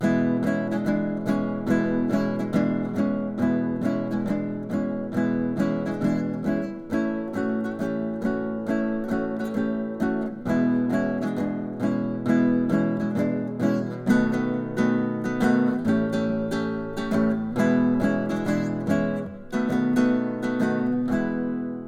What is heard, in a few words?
Raunchy Blues